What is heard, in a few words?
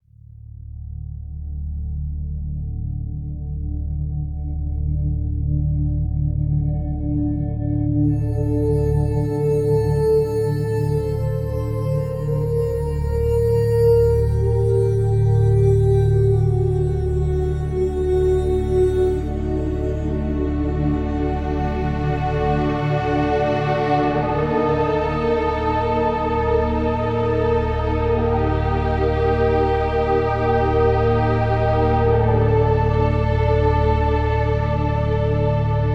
Dialog Ambient; gamerip; The Witcher 3 Wild Hunt